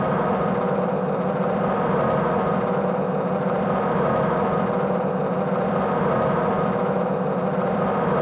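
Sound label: crane arm spin loop; machines mechanical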